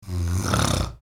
Comic sleep snore; shorts sound effects

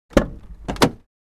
Truck door open

